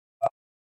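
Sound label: video stop, nothing phone sounds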